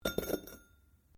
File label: METAL ON STONE IMPACT GENTLE; Metal (Sound Effects:Materials)